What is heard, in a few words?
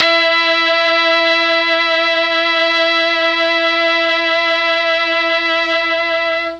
E3 Brass, Mellotron MKII; Mike Pinder - Mellotron Archive CD